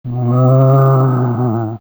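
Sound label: zombie battle, creature; GammaAge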